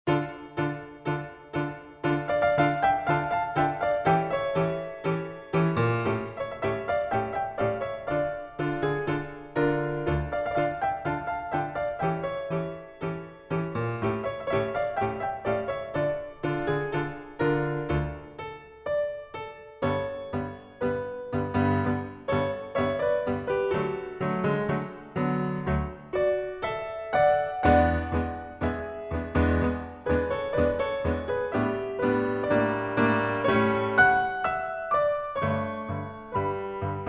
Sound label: Tango